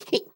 grab vocal; Scrat

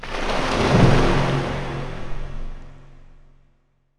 Fish Splash

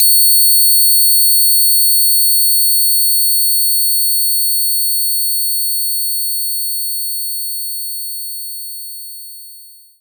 Shenai D6; knights try

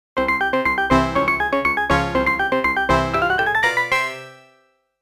Game Start

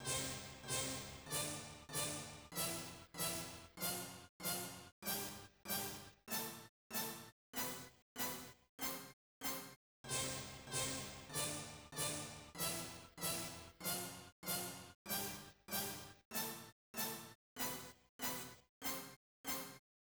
Sound Scale Check; Sexy Parodius; Konami GX; arcade

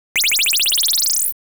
1-Up; Oretachi Game Center Zoku; Time Pilot

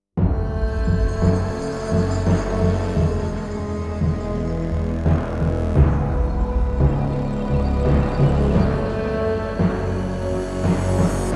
Jingle War; Endless Zone

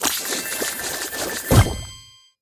Fish Swim Striped

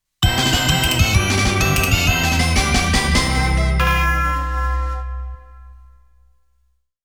GAME OVER (Game Over BGM); Konami Game Music Collection

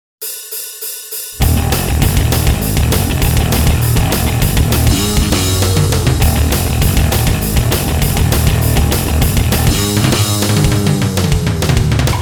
Guitar Minus Track

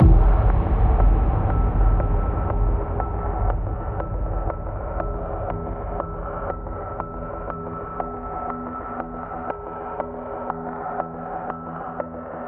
music knife-suspense; Necrobarista Final Pour

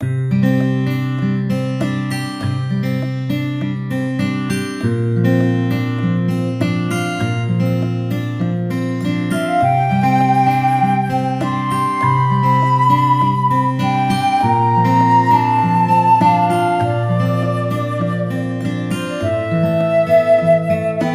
Exploration Friendly Land loop

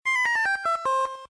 Sfx Mini Game End; save me mr. tako tasukete tako san switch windows gamerip 2018

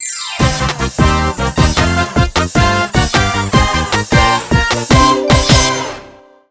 Transformation Jingle

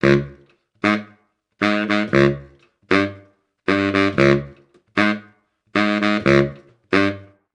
Guit

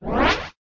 Portal Enter; Golf Blitz 1.12

